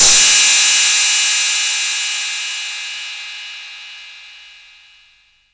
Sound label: crash cymbal d sharp key, Royality free crash tuned to the D# note; drum sample